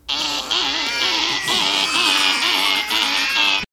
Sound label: gremlins alarme